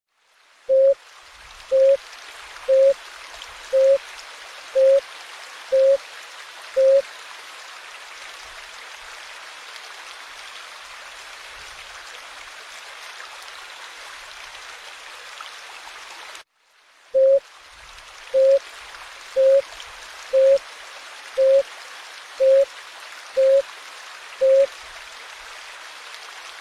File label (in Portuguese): Canto do pararu azul